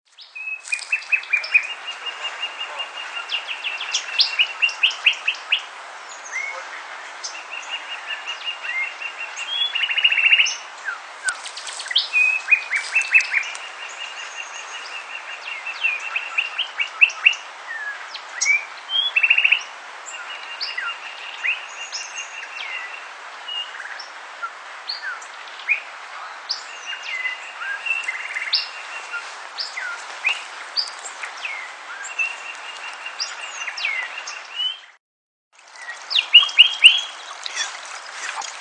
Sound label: Sinaloa Wren song; RThompson